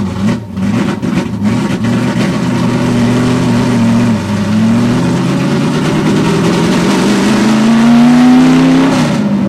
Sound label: first gear rev loop, vehicles; video games; junker; source engine; ep2